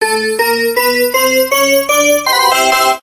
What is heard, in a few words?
addfreespin; Hot Choice